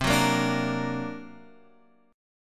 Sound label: D Augmented 7th C, guitar